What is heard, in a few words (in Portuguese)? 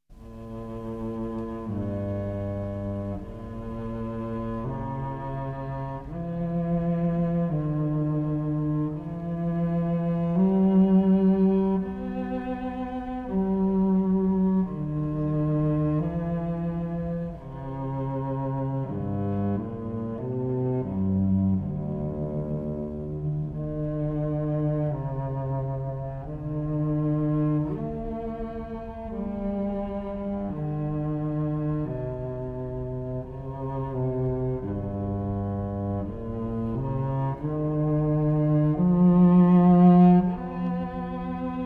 Violoncelo